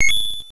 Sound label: pickup much money; castlevania